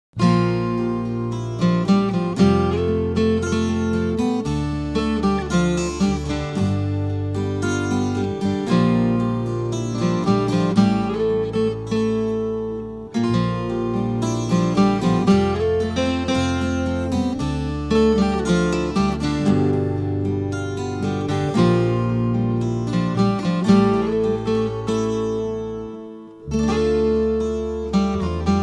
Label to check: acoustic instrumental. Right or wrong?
Right